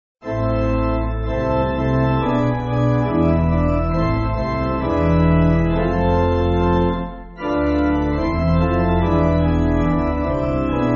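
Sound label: Pipe, Pipe Organ; The Birds In The Forest; Norway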